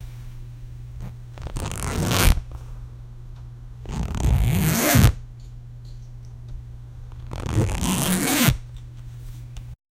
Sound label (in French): bruit de la fermeture